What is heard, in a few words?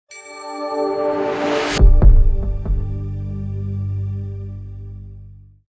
power on; Tigo